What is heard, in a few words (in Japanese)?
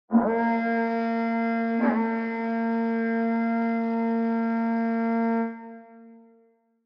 Horagai